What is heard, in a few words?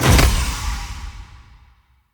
Bonus Damage; Weapon Impacts; Sweeteners; Virtue Bonus